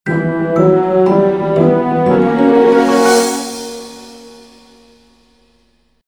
Jingle The Door is Open; rayman 2 remastered soundtrack

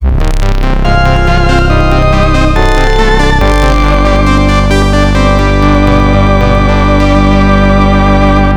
All Clear; Castlevania Bloodlines; Castlevania The New Generation; Vampire Killer; Genesis

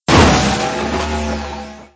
Ufo Damage; Angry Birds Space

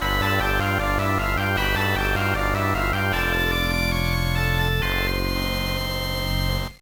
Jingle; Momotarou Dentetsu G Gold Deck wo Tsukure; GBA

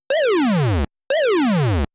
Blaster Shoot; Xevious

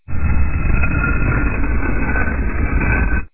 Door; Fireboy and Watergirl

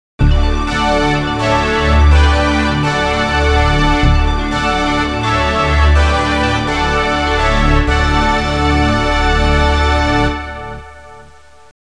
GAME OVER (Game over); for Raiden I

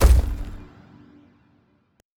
Level Editor Object Place Stone

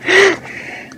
Fatigue Hard

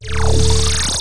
SFX - Spike Ball Duration; PangYa Fantasy Golf

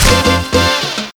Fruit Breaking; angry birds rio; Fruit Breaking Scoreitem

